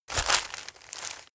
step forest walk

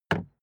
suv gas cap close soft; verkehr transport; autos

